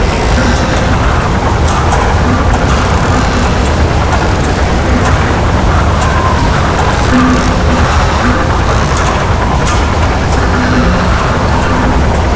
helicopter wind loop; vehicles

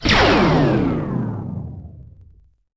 Laser; Sid Meier's Civilization 4 Complete; Beyond the Sword; Final Frontier; Units

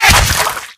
bullet impact, infected gore; npc; bullets

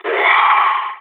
death, balkan; Counter-Strike Global Offensive

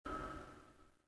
Space Tap; Ambient; Sid Meier's Civilization 4 Complete; Beyond the Sword

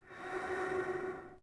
Gasmask Exhale; Sid Meier's Civilization 4 Complete; Beyond the Sword; Units

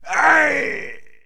man death; Mount&Blade Warband

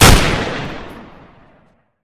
famas, weapons; Counter-Strike Global Offensive

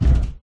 tank landing

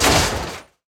Collision; AssaultCube; Alien Stars; American Muscle Series

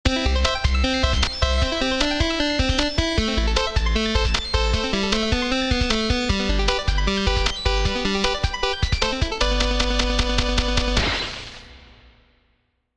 round mvp anthem, music; Counter-Strike Global Offensive

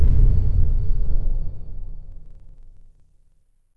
Space Junk Bump; Ambient; Sid Meier's Civilization 4 Complete; Beyond the Sword

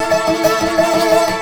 dobro danger

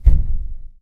drywall impact soft; physics; Garry's Mod; hl2

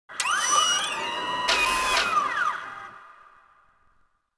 City Noise; Ambient; Sid Meier's Civilization 4 Complete; Beyond the Sword

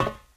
metal, footsteps walk; Left 4 Dead 2

